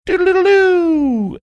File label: imitating whistle